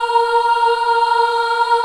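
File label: A#3 child ah right, Choir; USB Soundscan vol.28; Acoustic & Synth; AKAI; CHILD AHF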